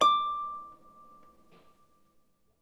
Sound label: HS D6, Harp; Miroslav Vitous - Percussion & Harp